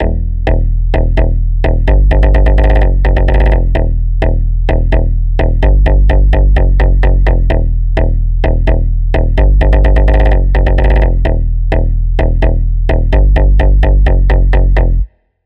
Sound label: Bass; Routed Sound; Spire Expansion - Hyper Gamer Vol.1